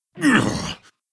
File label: Wound; Halion